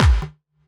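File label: snare drum bass drum, Dance Mega Drums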